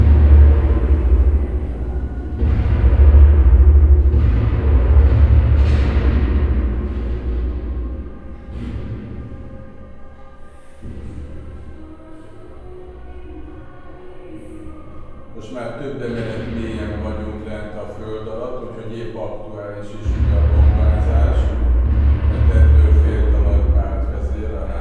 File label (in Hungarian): atombunker robbanasok